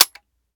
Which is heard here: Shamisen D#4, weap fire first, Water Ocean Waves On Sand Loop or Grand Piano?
weap fire first